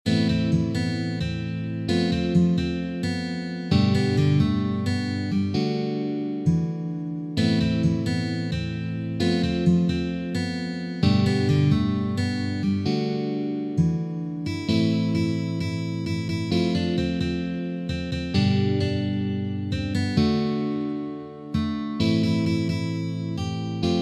Fast Tempo, guitar

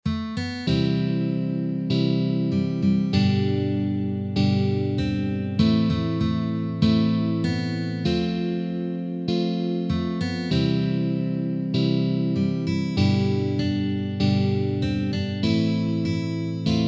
Chorus Slow Tempo

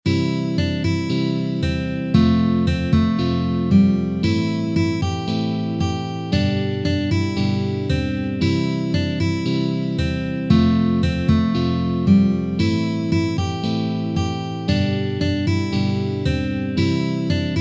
Verse Slow Tempo

